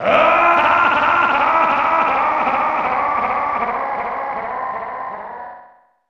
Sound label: Evil Laugh; Hokuto no Ken 5 Tenmaryuseiden Ai Zessho; SNES